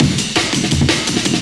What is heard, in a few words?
Liberty Amen, Jungle Breaks; M8